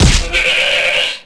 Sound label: hit goat; animal; Tony Hawk's Project 8; XBOX